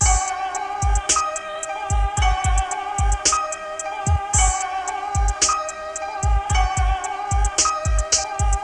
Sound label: Loop; Mind Blown